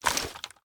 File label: low, law